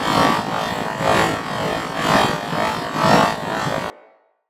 Shield Loop; Corpus Nullifier

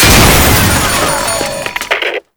Tank Phonebooth Explosion; Enemies; Gameplay; Lasrian Tank; Warframe

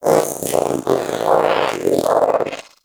Companion Vocal Idle Growl; Pets; Warframe; Zanuka

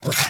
Puppy Idle Snort; Warframe; Kubrow

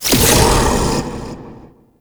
Shrine Assemble Frag Deposited; Warframe; Duviri Murmur

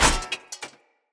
Sphere Polearm Medium Impact Metal; Player; Environment

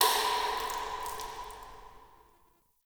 Interior Cave Drips; Ambience; Warframe; Duviri

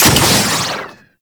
Heat Gun Fire; Warframe; Grineer

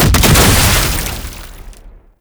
Suicide Scuttler Explosion; Enemies; Gameplay; Boss; Warframe; Oraxia

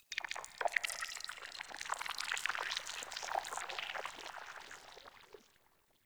Tunnel Critter; Warframe; Tau

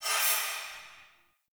Health Regain; Characters; Heal Stingers; Envoy

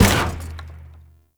Stadium Drumkit Destroy; Warframe; Ambience; Nineteen Ninety Nine

